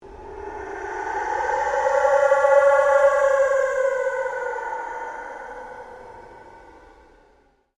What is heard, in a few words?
PULSE LONG SWEEP